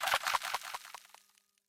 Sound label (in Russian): prosedaem korka rushitsya s bryizgami